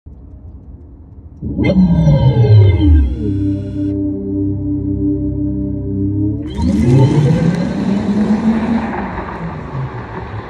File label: electric car